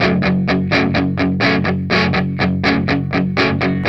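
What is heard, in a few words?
125, Vintage Blues Guitar rhythm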